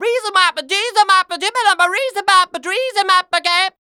female dance vocals; Best Service ProSamples vol.12; AKAI

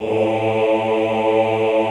OHH A1, Classical Choir; Best Service; Extended Classical Choir

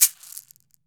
CAXY, CAXIXI

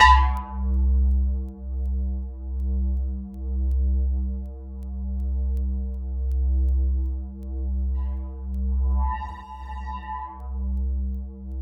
C1 FM, synth line; 90 s Sample CDs; NiceBeats; SynthLine VOL-20

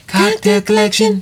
sung, Vocal Lix; Kocktail K Spoken

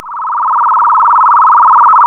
C#, Synclav FM, FX; Big Fish Audio

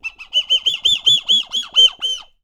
BD CALL, BIRD CALLS; Voice Crystal - Steve Reid Definitive Percussion

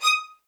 MED ATT D#, soft violins; Q Up Arts; Denny Jaeger Master Violin Library

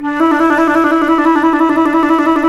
C flute trill

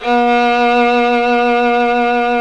Synclavier Strings; ILIO

